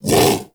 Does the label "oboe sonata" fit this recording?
No